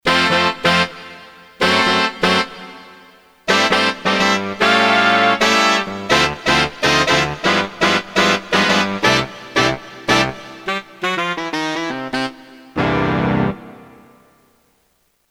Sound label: SAX Ens, Korg DSS-1, Brass; KSDU-002 Brass